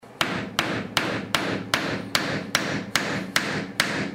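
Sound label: hammer nail wood; hmr nail wood new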